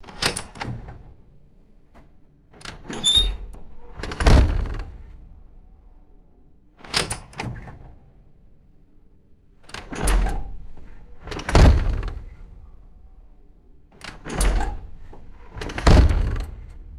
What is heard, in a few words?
heavy glass door opening and closing; doors